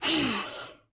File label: Woman Frustrated; Zomboid; Lifestyle